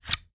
medieval dagger draw from sheath fast; still north media

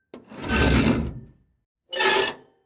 Just Metal Squeaks and Moans; soundbits

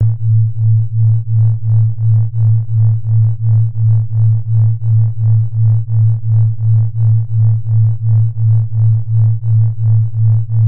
sound design drone electric buzz sci fi; sound effects one

